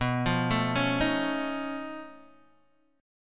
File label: B 9th Flat 5th B down Guitar DADGAD